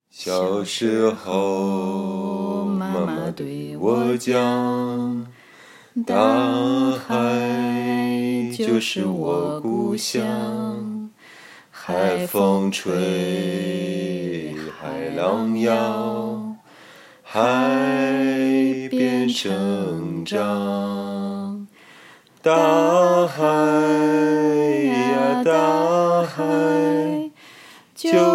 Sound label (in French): chantent